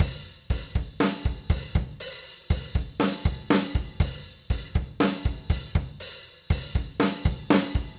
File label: SO3 Drum